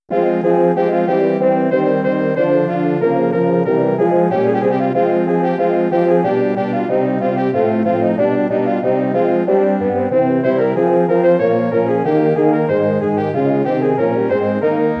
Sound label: Sonata; Stoerl